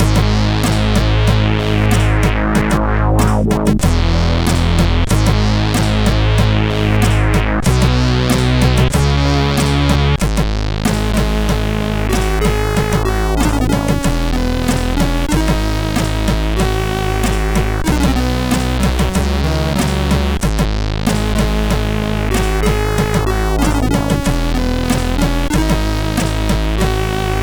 sid CSG8580; Watcha Toom; hvsc

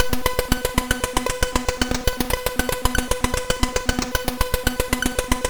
Synth Sample, MOS6581R3

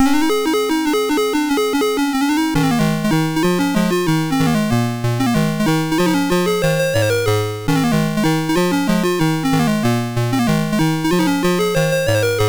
Quari, MOS6581R2

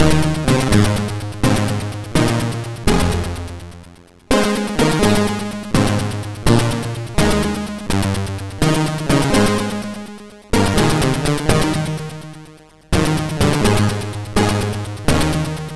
sid MOS6581R3, GAMES; Cisco Heat

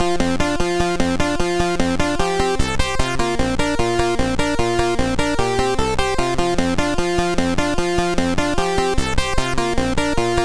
Fire to Jump sid MOS6581R2

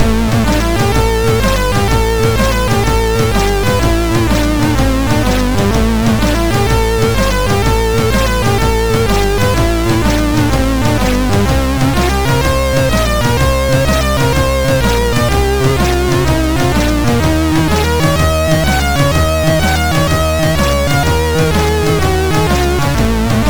Loader, MOS6581R4; Systeam Demo

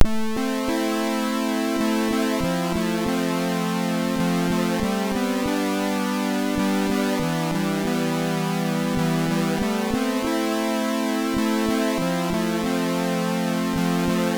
Intro, sid MOS6581R3; Lethargy Intro

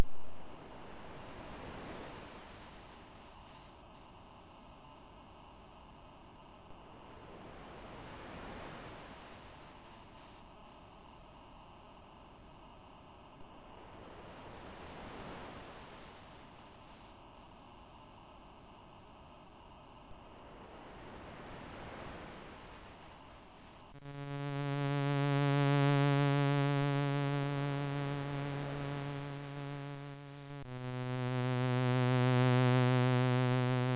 sid MOS6581R2; Mechanical Waves

